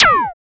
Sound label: Laser